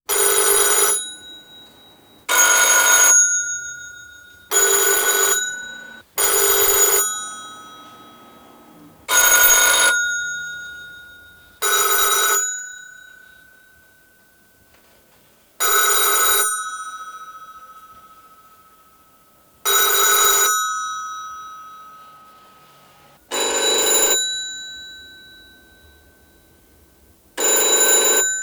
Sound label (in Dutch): telefoon diverse rinkels